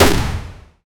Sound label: video game axe impact sou